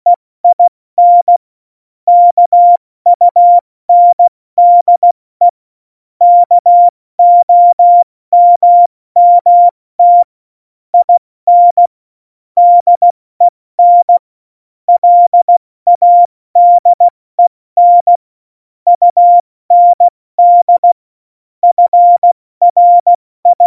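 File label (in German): Witz, cw morsen; Morsekurs; Witze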